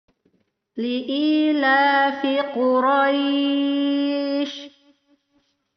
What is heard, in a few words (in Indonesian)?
Perekaman baru Karaoke denoised; cara waqof; cara waqaf